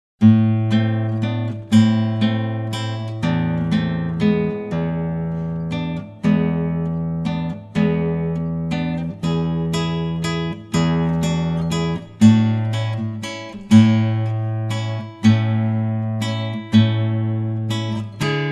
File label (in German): walzer, Gitarre; klassisch